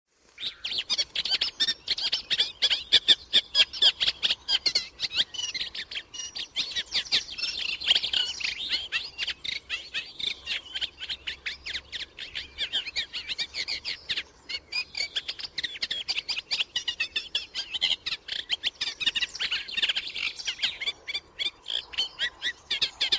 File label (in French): Rousserolle effarvatte